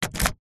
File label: vehicles car hyundai trajet key in ignition; 2006